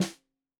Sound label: Snare 65 Reg Stereo, Deeply Sampled Drums